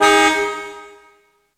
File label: PERC; ZERO-G; Datafile